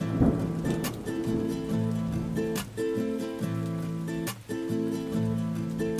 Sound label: roumba nylon guitar